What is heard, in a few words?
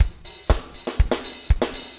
4 on floor 120, loops, 120bpm